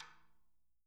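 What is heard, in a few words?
stick rim, Peter Erskine Living Drums; JZ XTOM 1; Sampleheads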